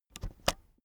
keyboard external space press slow soft

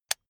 electric button drill direction switch lock heavy

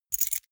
key ring small grab

